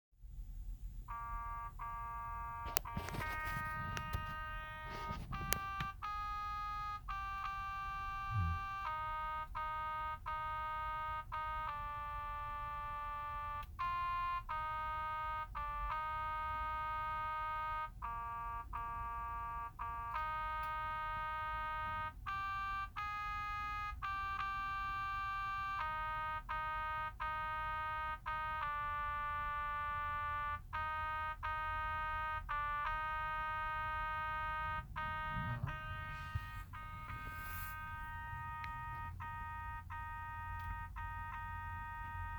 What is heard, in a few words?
Alto